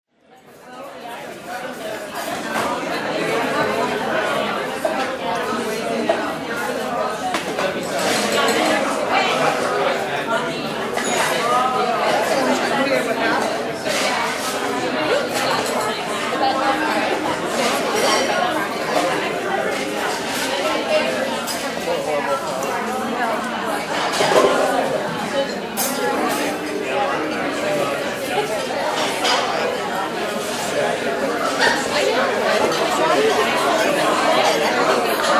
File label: Sound Restaurant